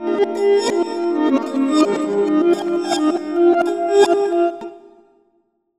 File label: STRINGS, REVERSE FX; USB Soundscan vol.60; Asian Traditions; AKAI